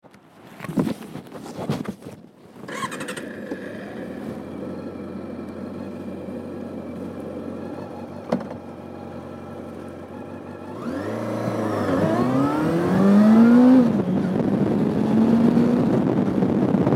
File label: CB400SF Riding